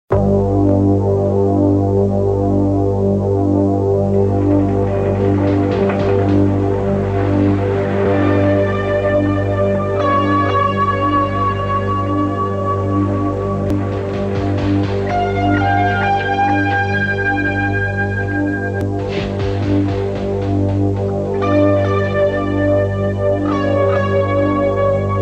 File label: suspense theme